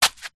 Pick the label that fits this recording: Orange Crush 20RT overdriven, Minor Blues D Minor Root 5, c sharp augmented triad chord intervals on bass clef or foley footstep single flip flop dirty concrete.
foley footstep single flip flop dirty concrete